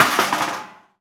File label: Something broke; DS; NEWSMB